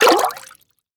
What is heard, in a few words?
Bird Exit Water